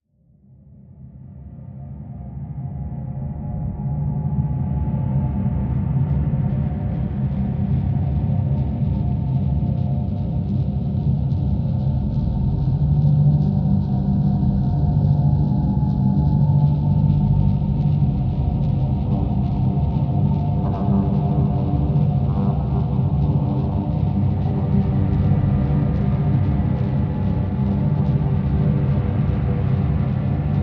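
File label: drone G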